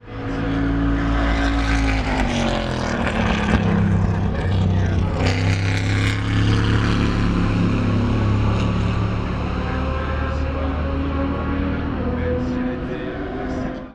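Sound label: corvette after esses; sunday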